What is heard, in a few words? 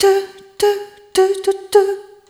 Rhythmic G